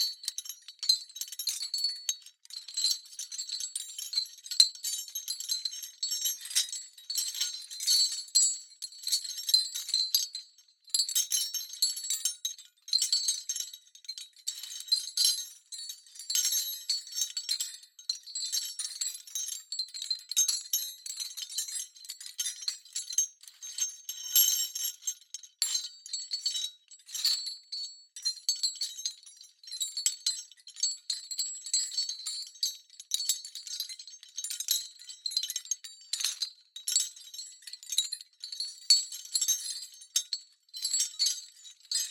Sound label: Chains pitched Chains pull Hanging Jingle